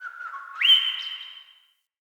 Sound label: Cave Bird; Caves; Glades Of Andurin